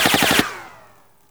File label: Pow; 1944 Battle Of The Bulge